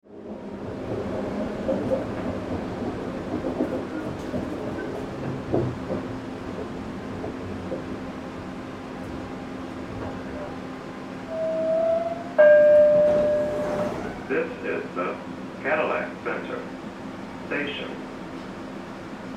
Vehicle People Mover Interior PA Announcements Monorail; Detroit